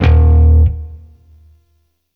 Sentient Shawzin Oct One D Sharp; Warframe

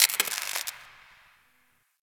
Derelict Spark One Off; Interior; Ambience; Warframe; Orokin Derelict

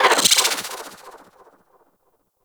Bolt Snooze Loop Stop; Warframe; Ranger

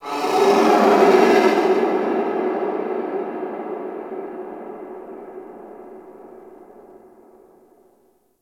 Moon Creature Large G; Ambience; Warframe; Orokin Moon

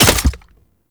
Shot Mech, Weapons; Warframe; Cedo Prime

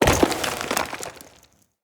Thorn Bow Stone Arrow Impact Enemy; Weapon Impacts; Envoy Fey Bow

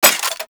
Burst Rifle Mech; Grineer; Weapons

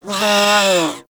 Feral Vocal Death F; Warframe; Catbrow

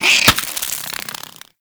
Devour Enemy Bite; Warframe; Mummy

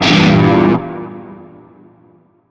Shawzin Phrygian Chord; Warframe; Narmer Shawzin

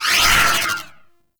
Void Dagger Proj By; Warframe; Zariman Dagger; Void Mode

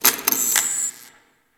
Light Flicker; Ambience; Props; Warframe; Orokin Derelict

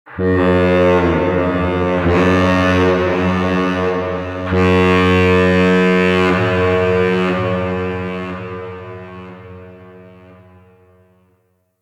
Castle Distant Dark Horn; Ambience; Midrath Island

